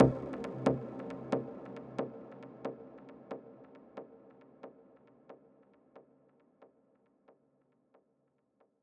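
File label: synth hit wet C minor; Hits; gettingold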